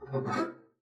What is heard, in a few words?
arco noise jumpy short, double bass; dsmolken; noises